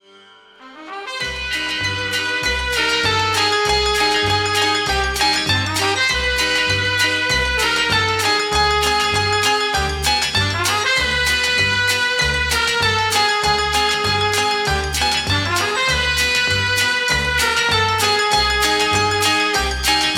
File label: Orchestra and Chorus